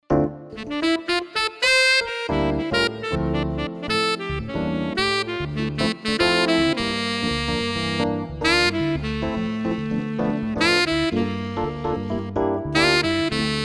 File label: tenor club, ketron audya; bati ses ve ritim tanitimi